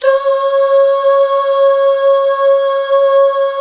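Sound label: Duh Female; Best Service Gigapack 1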